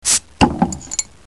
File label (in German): oeffnen